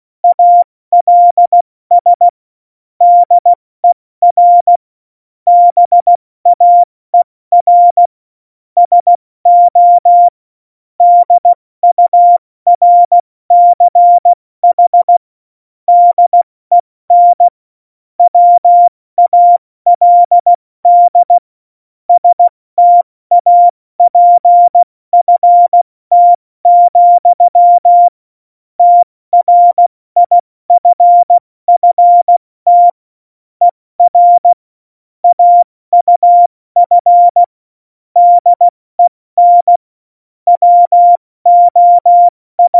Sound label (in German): Witz, Morsekurs, 70 F80; Witze